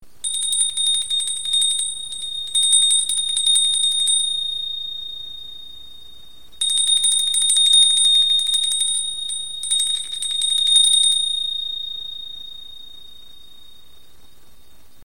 pferdeglocke klein, schellen

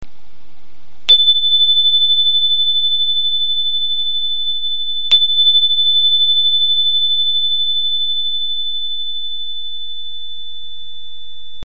klangstab

